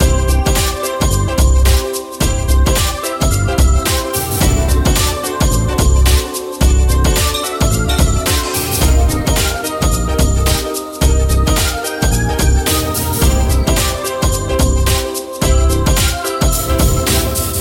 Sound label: Groovy Game Theme Loop